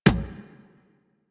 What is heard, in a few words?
Beach Ball Floof Kick